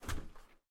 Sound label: Ladder Hand; Game rip; MiSide